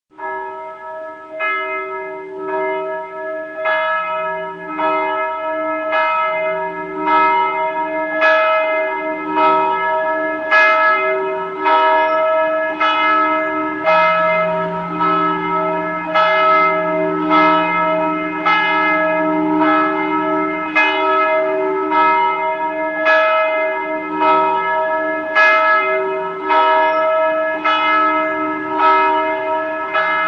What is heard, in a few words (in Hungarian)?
HARANGSZÓ ESTÉRE; szignalok; SZEKELYUDVARHELY